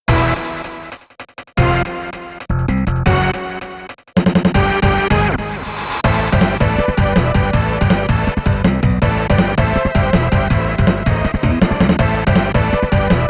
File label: VS - Chainsaw Bull (Stage 2 Boss); ROM Cassette Disc in NATSUME Vol.3 powered by TAITO